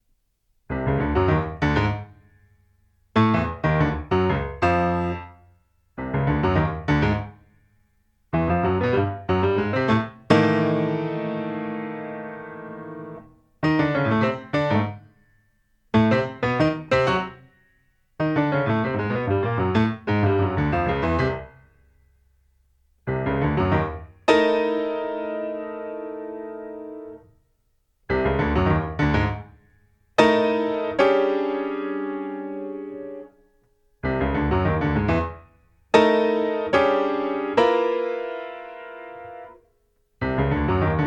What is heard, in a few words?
Piano; CONCERT RADIOPHONIQUE A L’AUDITORIUM XENAKIS DE STAINS